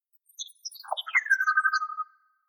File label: SciFi Robot Chatter Computer Button Digital Hollow Space; Sound Design; Tom Hutchings